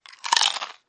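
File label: 1 Louder Bite Potato Chip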